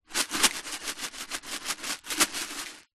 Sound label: fuse beads in plastic tub movement shake